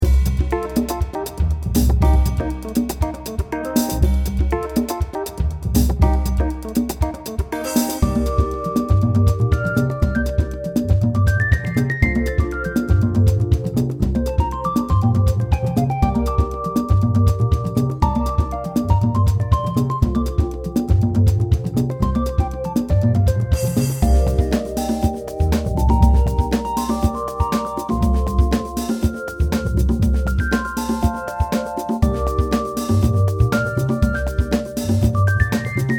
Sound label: Funky Groove